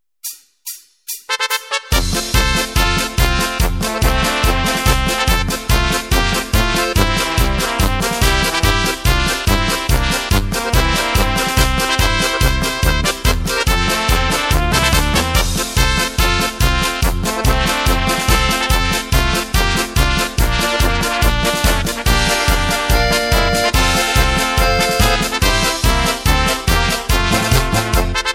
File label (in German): Polka